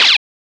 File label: dash; HeavenStudio; sick Beats